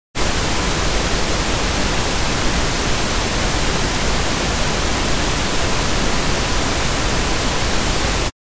alte cpu onboard, hochfrequentes fiepen arbeiten des pc s zu hoeren

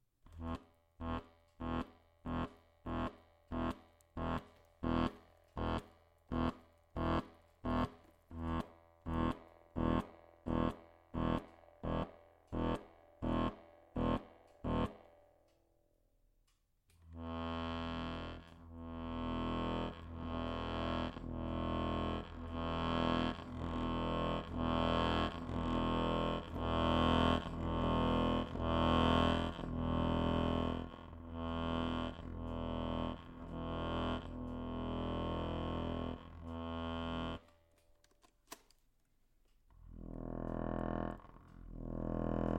zungengeraeusche g, ventile bass hohner morino

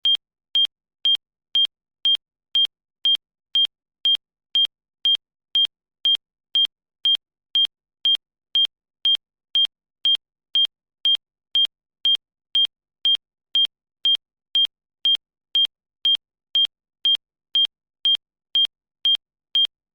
ton burst

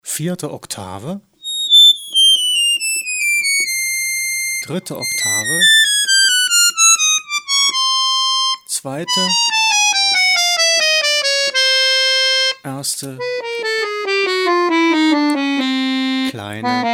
oktaven, Melodiebass; Konvertermodellen

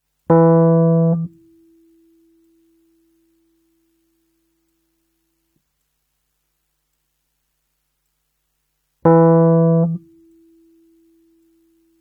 rhodes reso, Tine-Resonanz bei elektromechanischen E-Pianos

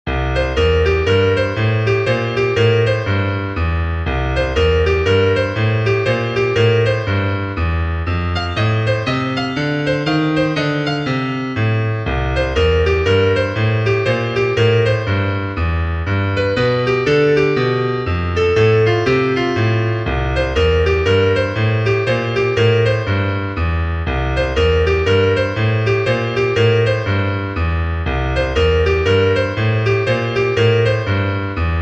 blues for fant melodie und bass

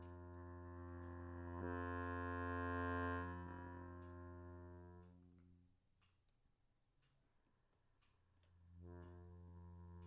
ventilgeraeusch g, Bass Hohner Morino VM

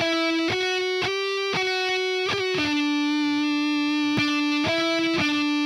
engl savage komisch; fizzeln uebersteuern; komisches fizzeln uebersteuern bei engl savage

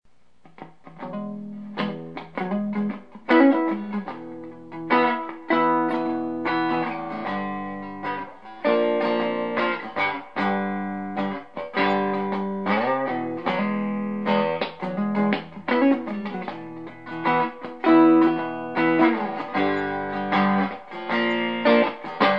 clean neck kurz, gitarre fender stratocaster; Fender Yngwie Malmsteen Stratocaster

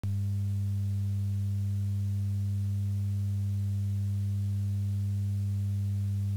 ohne mikrofon, Brummen

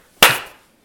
klatsch

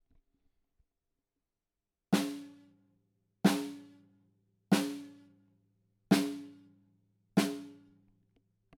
snare seitlich; snare teppich erzeugt unangenehme geraeusche

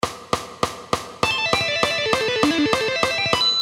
highspeed, schnelles tapping ueber mehrere saiten

